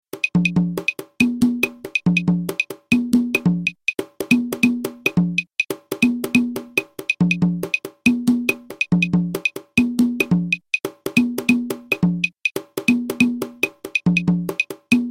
guarapachangueo cajon clave; clave 2 3

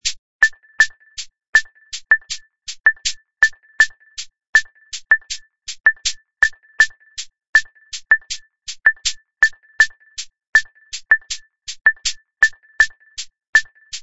clave rumba 2 3 decomposee; Avant d'aborder les divers rythmes cubains, il est essentiel de se pencher sur la clave

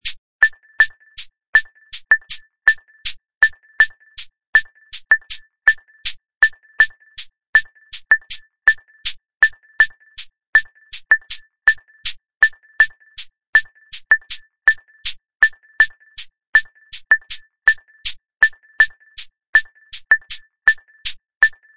clave son 3 2 decomposee

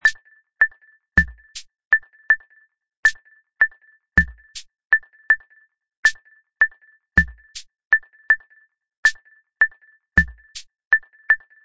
clave ponche appui; Avant d'aborder les divers rythmes cubains, il est essentiel de se pencher sur la clave

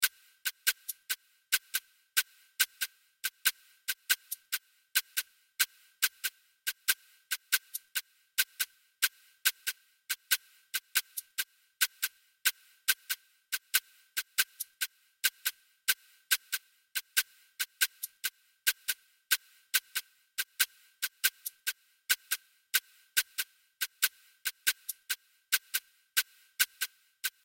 guarapachangueo palito lent

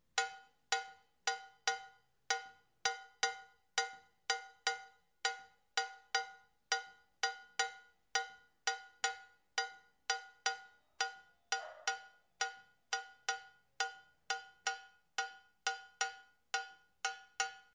congo laye cloche

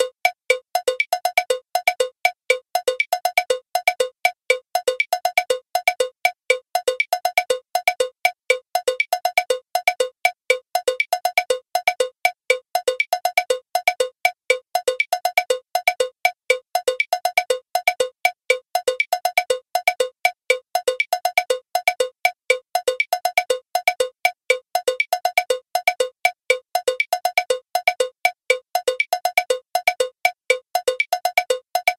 mozambique cloche; Le Mozambique est une musique de carnaval; Le Mozambique est une musique de carnaval créé en 1963 par Pedro 'Pello el Afrokán'

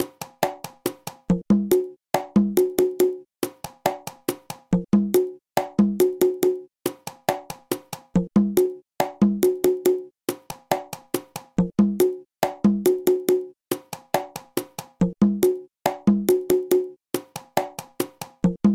pilon conga lent

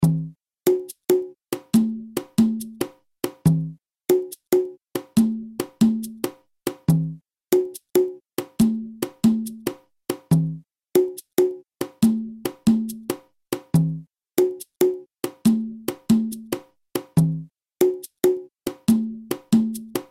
guarapachangueo cajon lent

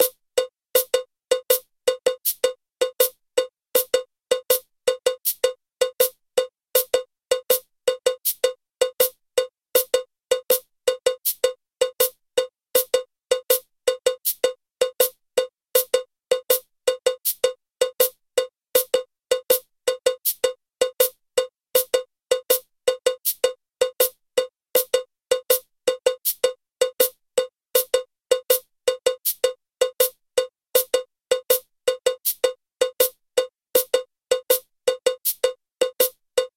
mambo cloche lent

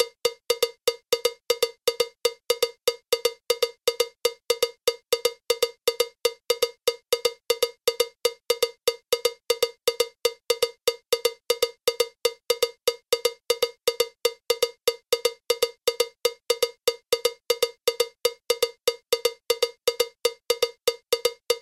new york mozambique cloche; Le Mozambique est une musique de carnaval; Le Mozambique est une musique de carnaval créé en 1963 par Pedro 'Pello el Afrokán'

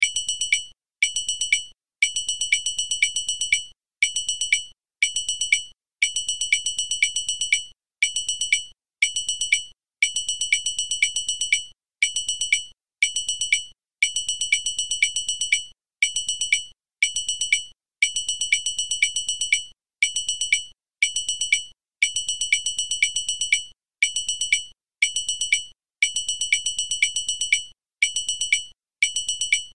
dengue roue clave

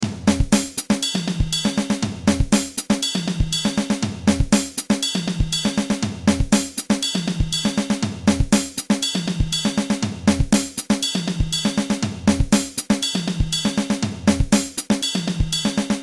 songo batterie

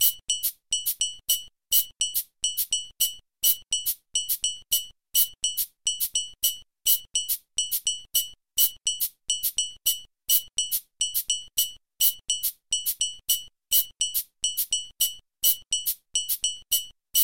abakua havane ekon

